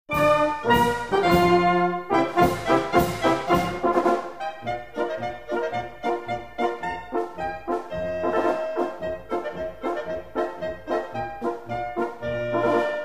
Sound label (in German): Polka Galopp